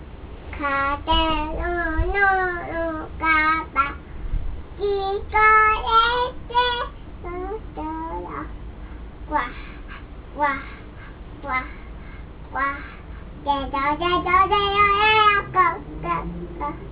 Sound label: song frog